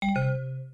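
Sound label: equipment removal system sound Windows XP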